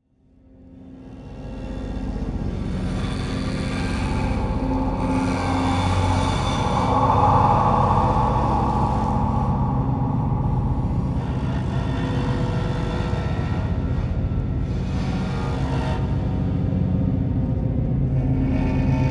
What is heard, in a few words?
Cinematic Macabre, Dark Skies - Cinematic Ambiences